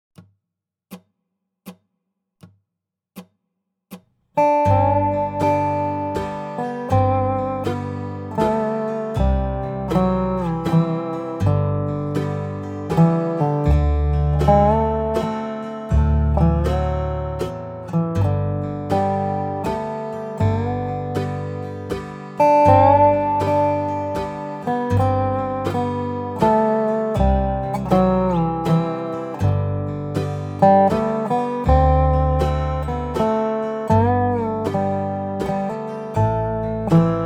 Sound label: basic melody Dobro with backing track; Away in a Manger; Holiday 2025 Dobro Zoom Workshops